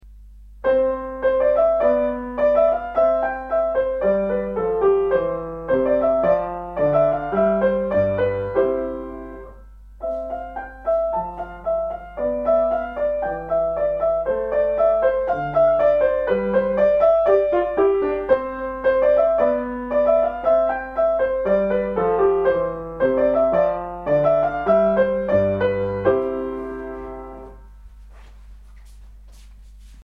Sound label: Gavot; Duncombe